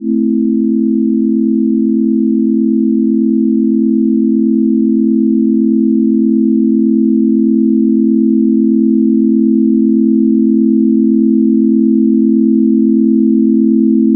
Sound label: ambient